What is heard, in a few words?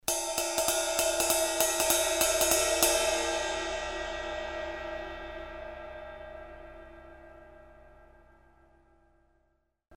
20in Custom Hybrid Ride, cymbal